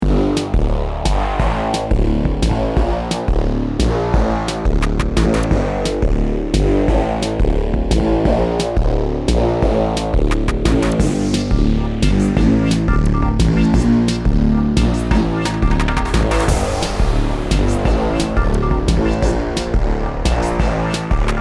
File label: Bones N Claps